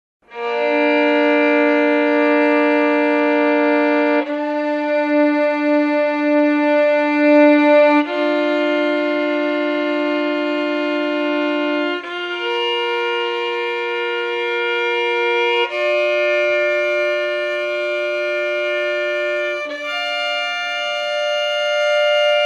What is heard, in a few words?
doublestop B dur